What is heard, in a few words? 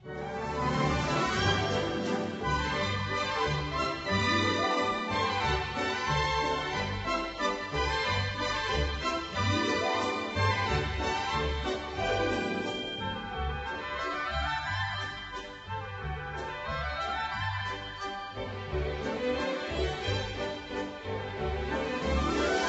Childrens Theme